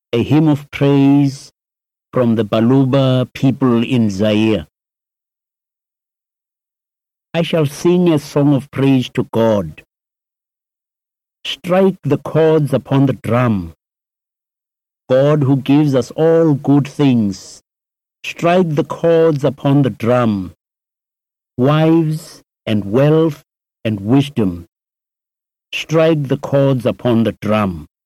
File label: Drumming, Baluba Zaire; Strike your Drum for God / Baluba People, Zaire – Give praises to God with Drumming